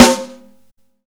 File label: Snare Live, Drum and Bass